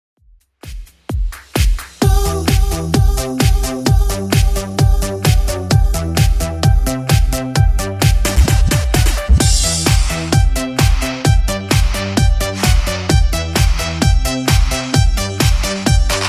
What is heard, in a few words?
80s Redrum 130bpm